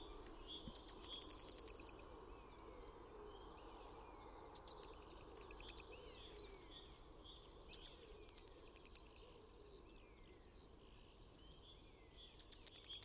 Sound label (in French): mesange bleue; chant